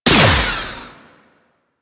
Mech, Weapons; Strun Prime

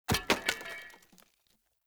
Barrel Wood Hollow Medium Destroy Chunk; Objects

